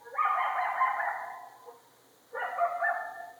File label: coyote